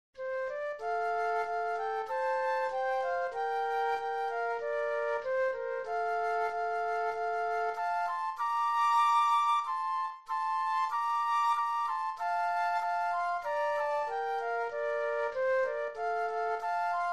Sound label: Flute Duet